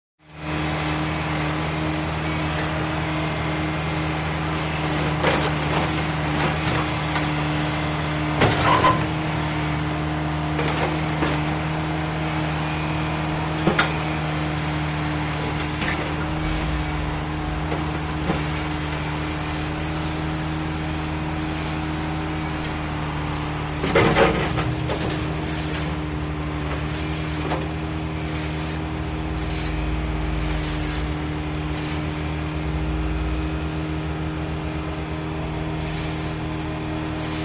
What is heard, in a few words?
Street construction; SOUND EFFECTS1